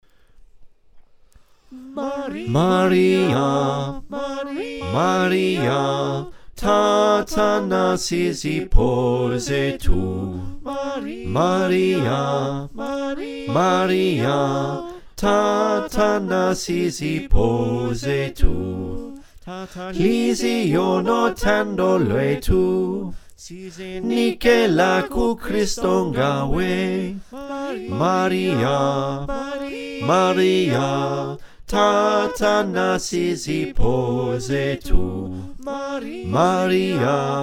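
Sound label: bass, choir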